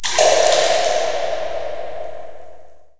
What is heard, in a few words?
drop; de tabocas